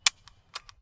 Rifle Clip Empty

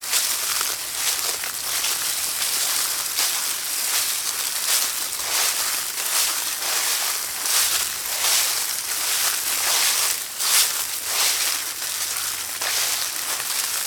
Dry Leaves

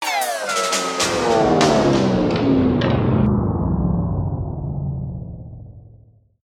Braking Of A Tune

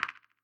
Click Wooden

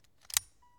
Gun Charge

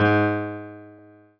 Piano Ff

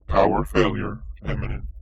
Critical Battery Alarm

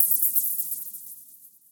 Bug

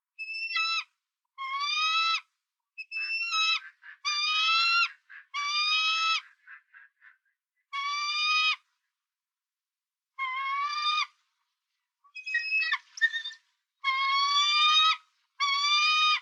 Eagle Squawks Calls

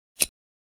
Click

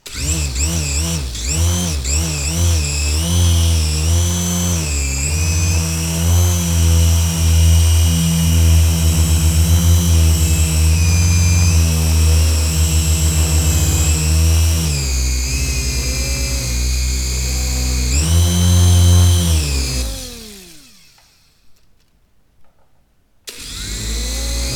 Toy Drone Engine Different Speeds

